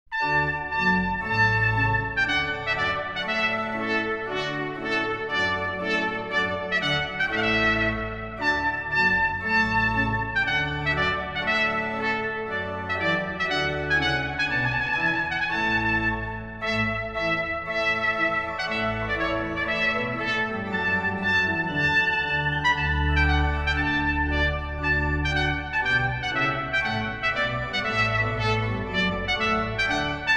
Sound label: Trumpet Tune, trompet